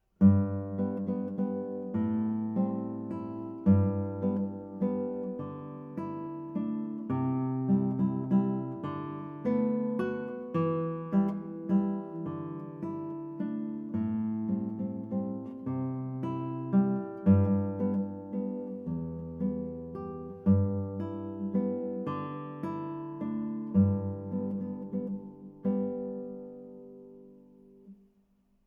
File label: Waltz; Daffodils